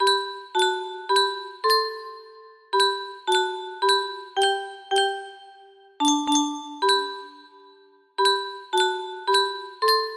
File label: church song